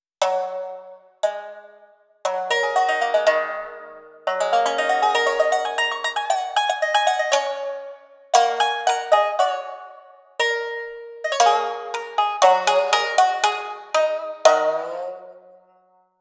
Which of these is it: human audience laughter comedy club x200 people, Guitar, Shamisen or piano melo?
Shamisen